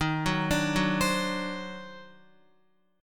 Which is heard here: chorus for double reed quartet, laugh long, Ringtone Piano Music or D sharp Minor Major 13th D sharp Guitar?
D sharp Minor Major 13th D sharp Guitar